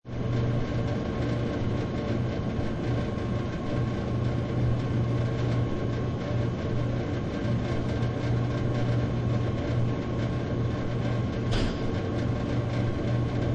Drinks Vending Machine; Machinery

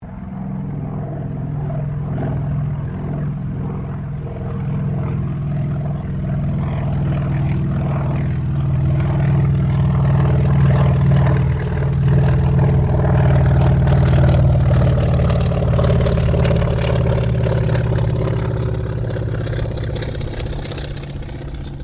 AIRCRAFT CAC WIRRAWAY PASS

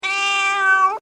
Animal Cat Tabby Meow; Domestic cats; Cats (Sound Effects:Animals)

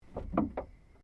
CAR SUBARU LIBERTY EXTERIOR BOOT OPEN; Family members Subaru; Vehicles

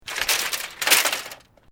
Metal Light Movement Minor; Materials

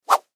Whip Whoosh Swoosh Rod Stick; Whips (Sound Effects:Objects); Foley; Blastwave

